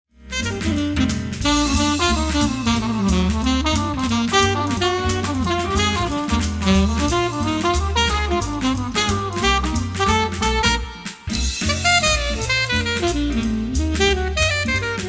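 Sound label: Alto, sax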